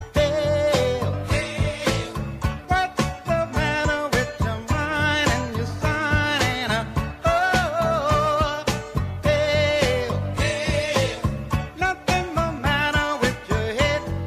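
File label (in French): pub, sonnerie; bouygues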